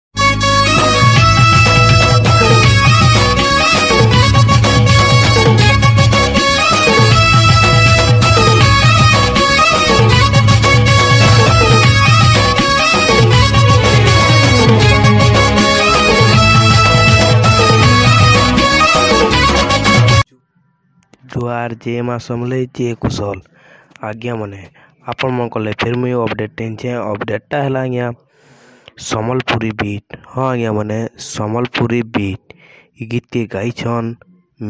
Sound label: Sambalpuri Beat